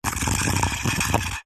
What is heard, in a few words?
food drink straw suck thickshake gurgle